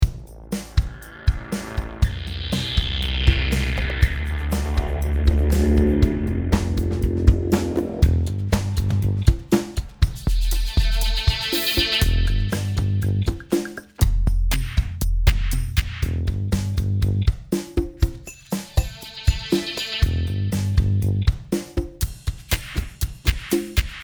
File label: bass